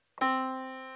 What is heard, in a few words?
yamaha Disk